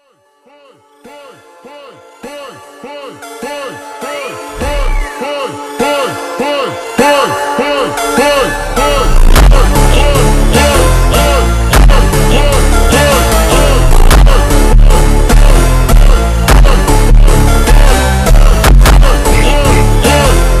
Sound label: super slowed reverb brazilian phonk